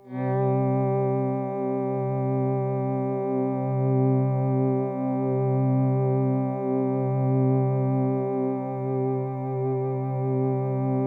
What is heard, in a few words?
C3, Synth Line